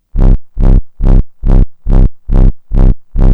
Trance Bassloop 140bpm, Bass Arps

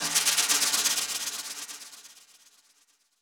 New Brush Snare Phrase; Snares

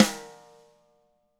RIM, TAMA SNRD, Drums; ILIO; Double Platinum Drums 2

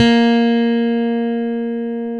BS CHAPST, ChapmanStick; Roland LCDP02 Guitar and Bass

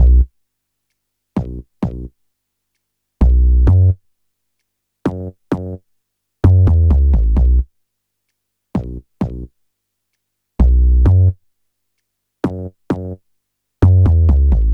BASS, Hip-Hop & R&B; USB Soundscan vol.47; AKAI

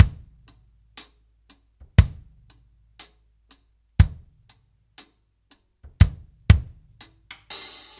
Ballad Loop, Drum, 70s Ballad; Drum Tools

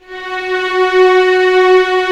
STR F#3 FF, STRNGS; C2-C4; Sound On Sound - Peter Siedleczek's Orchestra

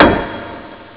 tom hall; ST-XX Amiga Soundtracker Sample Packs

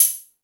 High Hat, E-MU Pro-Cussion

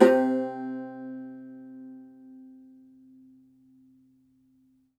Cumbus SAZ C, Plucked Strings

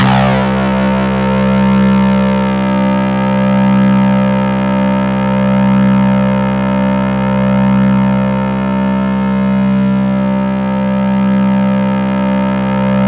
C2, Synth Line; 90 s Sample CDs; NiceBeats; SynthLine VOL-33

